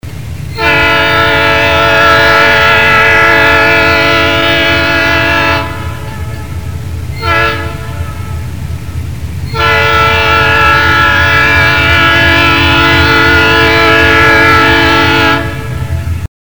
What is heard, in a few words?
diesel